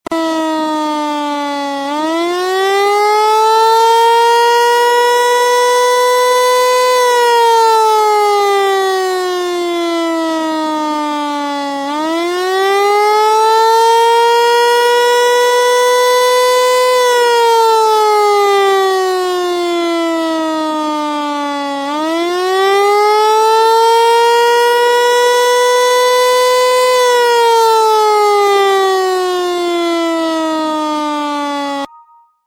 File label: Siren Wail